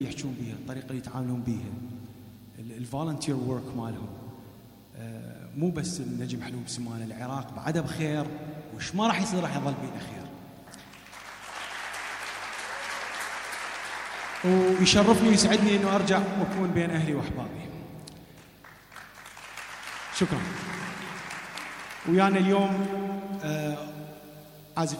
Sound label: musical iraqi ringtone